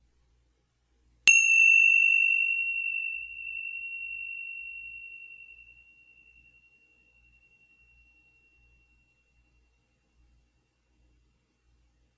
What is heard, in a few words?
bells 5 10 15 minutes